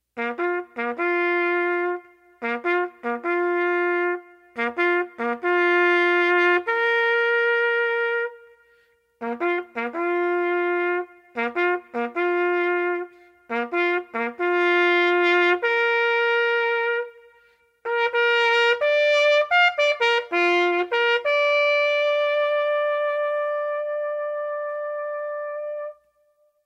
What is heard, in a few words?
Reveille